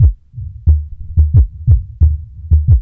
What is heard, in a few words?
THUMP; E-MU Producer Series Vol. 6 – Analogia Project; ScrogginsSecret2